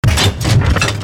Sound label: hit complex short